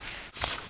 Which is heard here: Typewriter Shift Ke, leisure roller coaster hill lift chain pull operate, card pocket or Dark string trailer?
card pocket